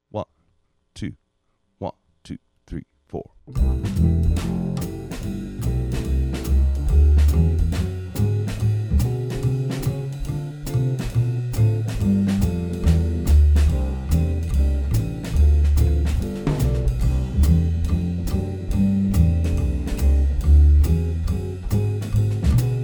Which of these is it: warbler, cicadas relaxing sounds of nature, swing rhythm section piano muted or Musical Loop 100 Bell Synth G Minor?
swing rhythm section piano muted